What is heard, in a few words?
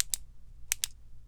Flashlight Clicky